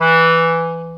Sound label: Alto Clarinet Portato E2; VarazdinOrchestral